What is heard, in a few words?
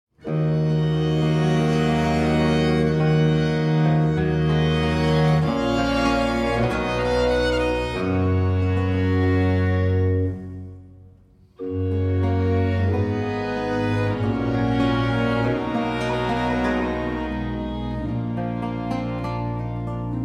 sonata a5 in G minor; sonate da camera 1670